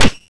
hit crete

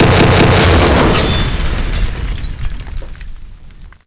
airplane

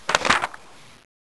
page turn

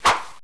dagger swing

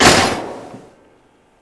car smack

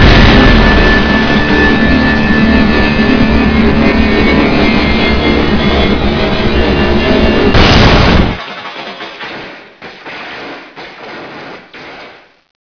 boss lazers

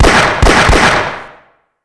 shoot

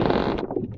creak, strider; scmod

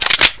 ak47 bolt pull

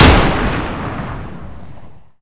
de shoot, deagle

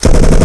Assault Gun Fire; ragemap2025; fournines

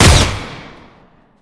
heal, weapons; healscout

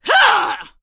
attack, elven female

